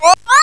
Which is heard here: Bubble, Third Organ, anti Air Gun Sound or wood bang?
Bubble